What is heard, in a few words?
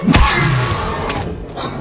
raptor attack